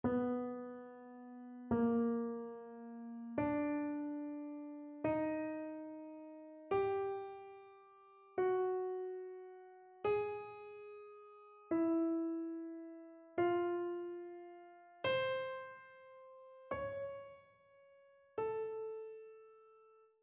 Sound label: row dictation; ear training